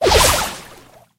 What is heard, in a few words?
gadget sfx